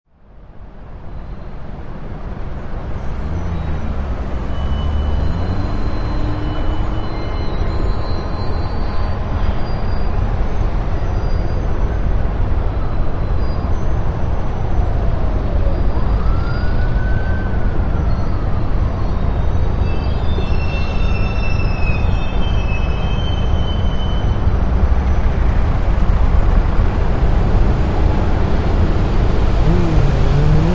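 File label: City Wash Heavy Traffic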